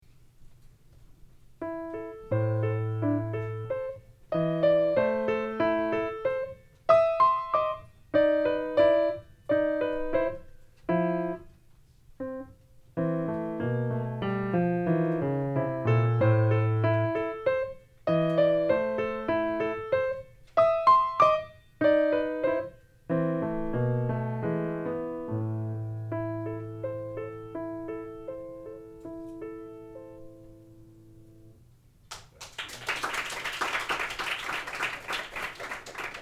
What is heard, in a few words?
Recital Folk Song; Schnittke